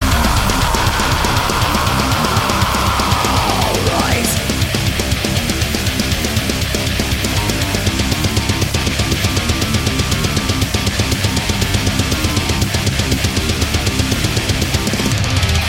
Heavy Metal intro

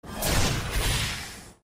Mystic Shot

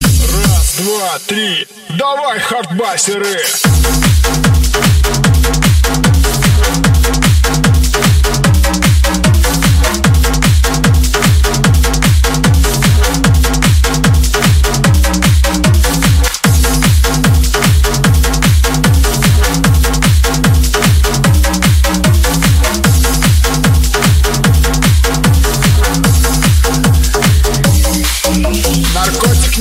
HARD BASS

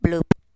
Bloop

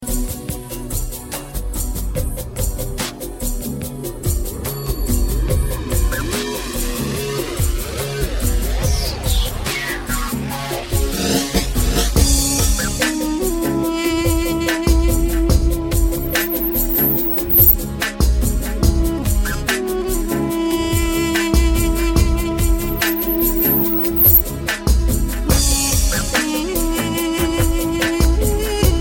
Indian Hot Music

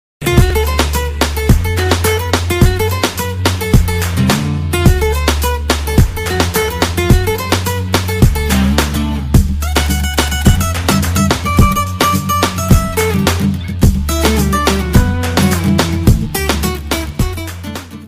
Guitar

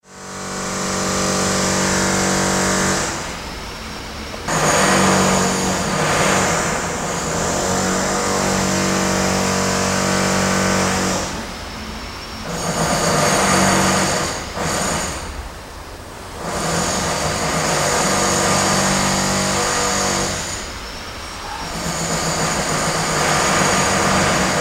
Electric Hammer

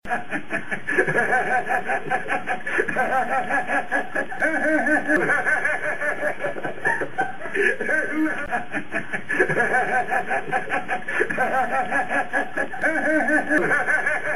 Idiot Laugh

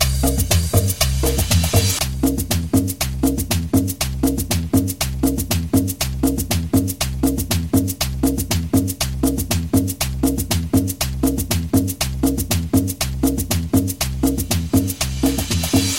cumbia intro

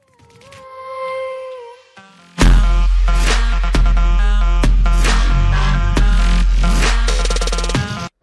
Intro Music

